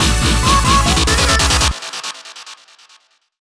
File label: dubstep club bass